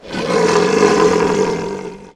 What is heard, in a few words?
lion roar message tone